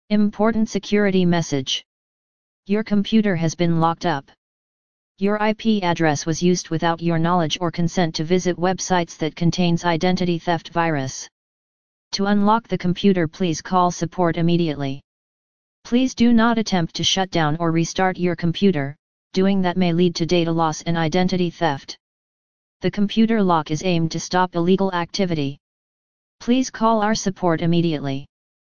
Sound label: Fm7 alert